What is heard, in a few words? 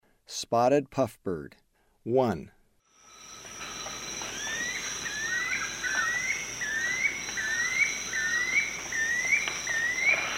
Spotted Puffbird; S. America; Eastern Lowlands